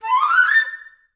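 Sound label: flute run up A4; Best Service; Peter Siedlaczek's Advanced Orchestra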